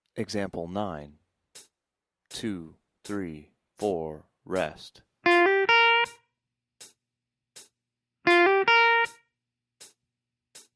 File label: slurs, blues guitar